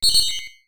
retro beep; sci-fi sfx

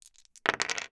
Roll 2d6, rolling dice; SFX Pack

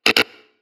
weird, sfx

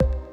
Blip, Drums

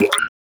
Wet Reverse; FREE SFX PACK VOL 3

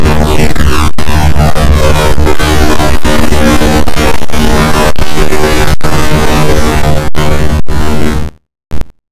Retro Electric Long

